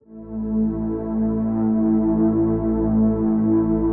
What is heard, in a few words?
122 BPM Pads Amaj Fmin Tonic A, ambient synth pads; MMB